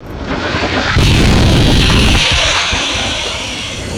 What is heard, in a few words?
short high intensity rocket engine blast; aircraft